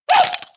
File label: Ice Collision